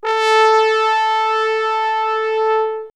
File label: Trombone, horn section; X-Static Goldmine; backtothe70's